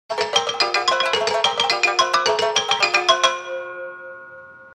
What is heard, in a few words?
gamelon on